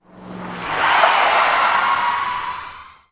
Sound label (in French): freine